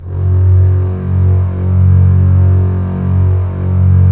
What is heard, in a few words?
STR, Roland LA Composer